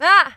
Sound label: surprise